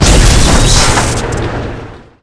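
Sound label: boss mech spider attack, monster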